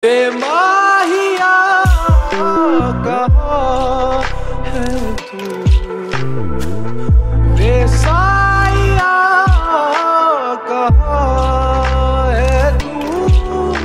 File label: Lofi Mix